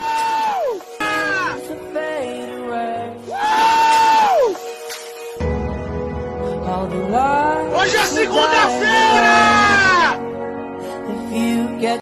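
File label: Brazilian rejoices to music; tik tok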